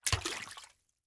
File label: Liquid Water Water Foot Stomp In Water